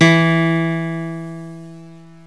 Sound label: nylon